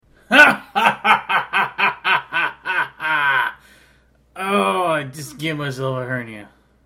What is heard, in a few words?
laugh